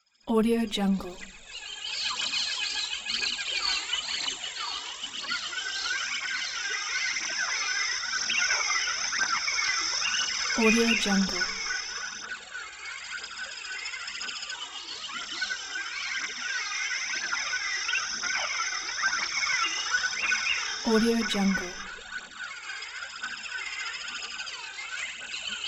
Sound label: jungle forest